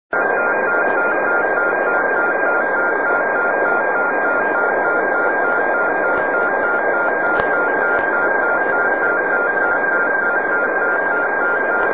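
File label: Unidentified 8 MHz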